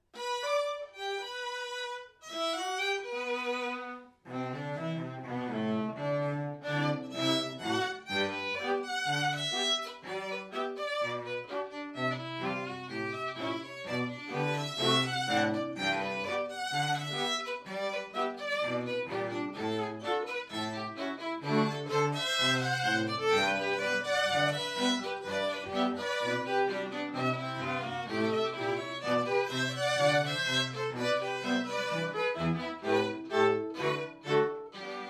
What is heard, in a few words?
Rag, string quartet